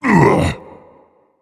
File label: Vocal Colossus Pain Reactions